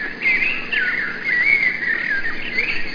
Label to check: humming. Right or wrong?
Right